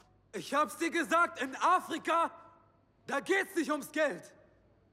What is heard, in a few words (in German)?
schreien; Synchron; Regie: Marius Clarén; schreiend